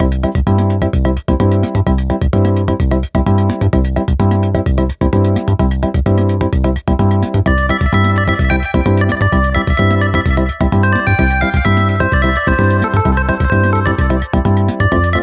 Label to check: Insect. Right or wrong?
Wrong